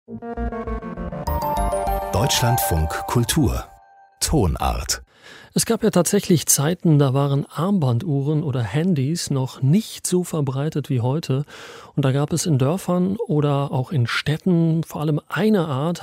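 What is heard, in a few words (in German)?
das handglocken ensemble im thüringischen gotha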